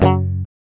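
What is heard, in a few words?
GUI F#, acoustic guitar; Best Service Gigapack 2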